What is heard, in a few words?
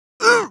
damage, pain; mohaa; dialogue